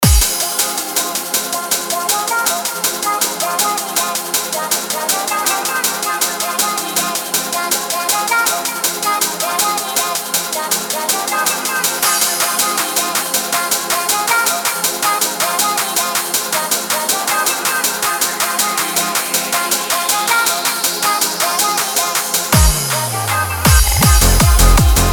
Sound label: waves remix